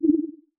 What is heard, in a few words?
interface button